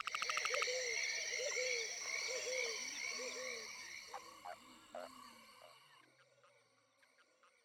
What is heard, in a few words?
intro forest yokai; lol